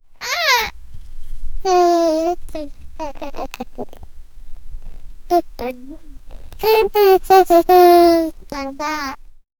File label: child smiling